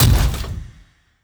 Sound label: construction step, strider; creatures; Half Life Model Pack